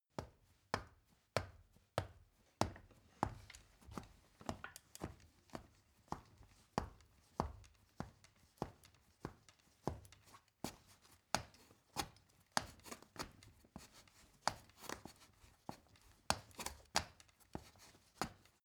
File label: step ladder wood medium; schritte